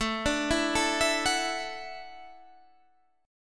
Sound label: A 6th Suspended 4th, guitar; A6sus; arpeggiated